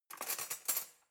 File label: cutlery knife drawer rattle soft